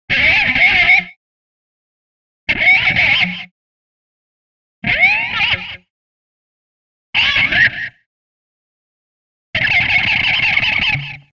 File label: Pick being scraped randomly on an electric guitar string; Music effect; Hollywood Edge Sound Designers Tool Kit